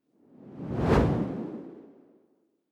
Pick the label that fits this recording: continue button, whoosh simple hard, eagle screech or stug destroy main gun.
whoosh simple hard